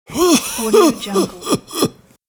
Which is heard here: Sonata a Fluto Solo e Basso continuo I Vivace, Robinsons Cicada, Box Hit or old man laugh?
old man laugh